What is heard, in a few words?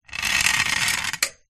household clothes hanger slide on metal rail